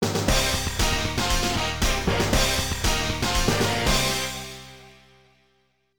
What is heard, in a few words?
Continue, Game Over; asura buster eternal warriors fuuki fg 3 system arcade